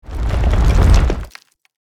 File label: Earth magic spell terrain manipulation